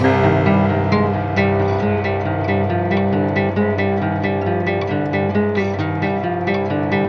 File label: guitar and piano, Loops, Sad